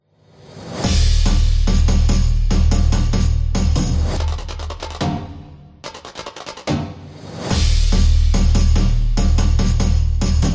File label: Samba Epic Sports